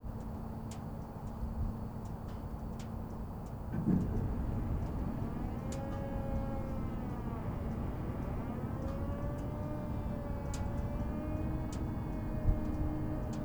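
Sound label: 3.6-metre-telescope dome rotation; soundscape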